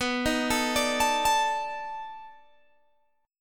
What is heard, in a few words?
B 7th Sharp 9th, down, Guitar, Open G